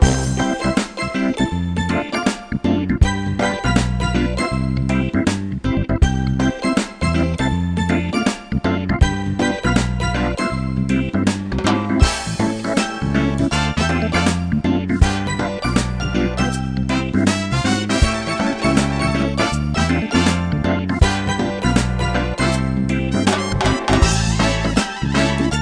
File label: Ingame Music; Junior Classic Games 3D